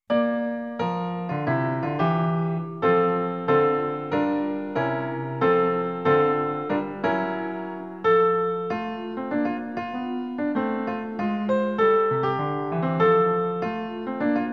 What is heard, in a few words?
Above Ground BGM; NINTENDO